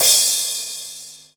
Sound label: cymbal sound c sharp key; Duration 0:01; cymbal drum samples